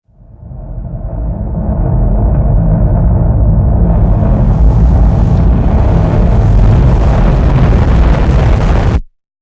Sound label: horror distorted windfire